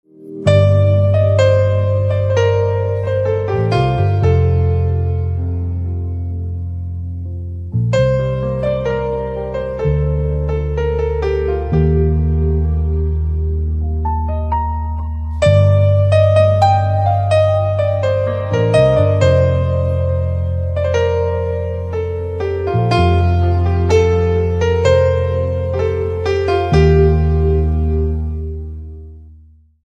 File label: silent Piano